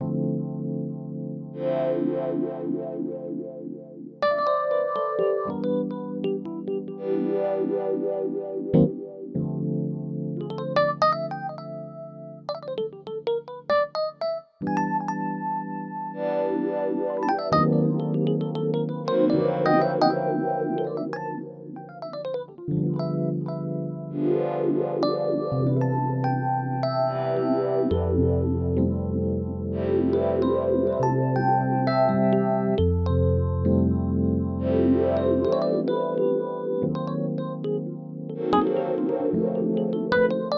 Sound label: Smooth EP, Korg Radias-R